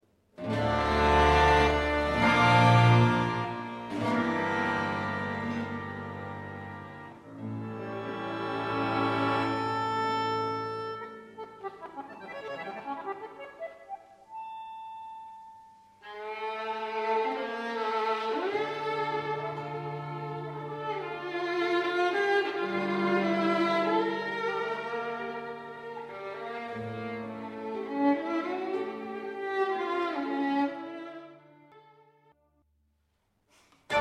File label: Czardas